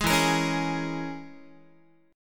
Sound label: E 6th Flat 5th E down Guitar; E6b5 strummed; JGuitar